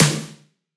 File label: drum hitnormal; osu